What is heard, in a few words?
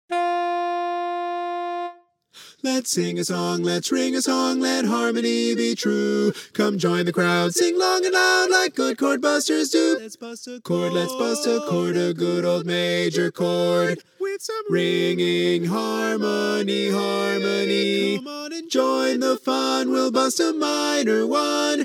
SSAA bass